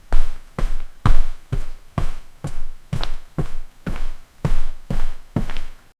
a sound like your stompin, indoor

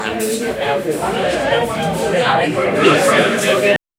a large group of friends, background noise

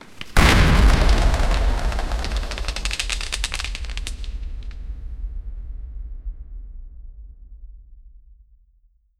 epic dramatic multiple, dice

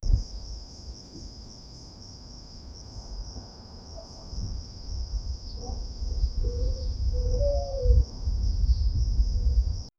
cricket stadium echoing w

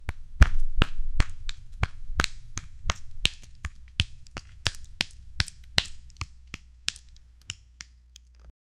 fighting, close combat

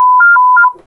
a chimney beep for medica; devices

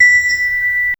soothing church bell soft

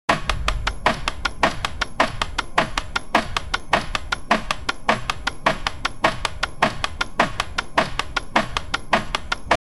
dhol beats quickening; world

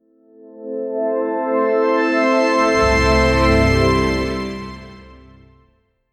business logo reveal

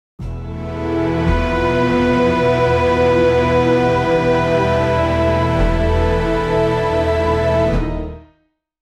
dark cinematic theme with; ancient ruins; SFX Engine

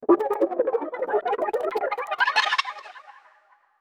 thinking sound effect; atmosphere

